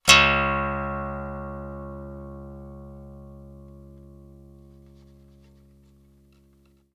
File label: E string; mono